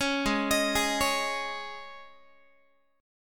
G sharp Suspended 4th Guitar chord, down; arpeggiated; 16,16,18,18,17,x; 4A,4E,5A,5D,5Gsharp,6Csharp

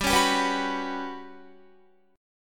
G Major 7th Flat 5th, Guitar; GM7b5; strummed; 15,16,17,16,18,18

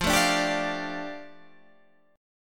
F 6th Add 9th F down, chord; F6add9 strummed

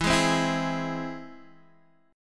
Ab Augmented, E

